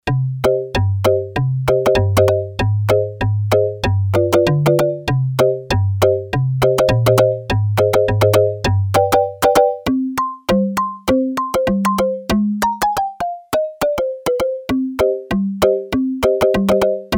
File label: Stone Phone, Yamaha TX802; Factory Patches; Voices